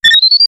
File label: turret lock